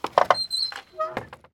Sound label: Doors Wood Gate Open; Counter-Strike: Global Offensive